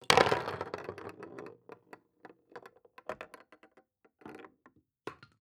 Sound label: Chess Pieces Rolling On Chess Board; Ultimate Chess SFX